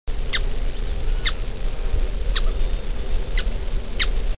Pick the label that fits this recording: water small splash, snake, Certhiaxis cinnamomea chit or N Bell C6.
Certhiaxis cinnamomea chit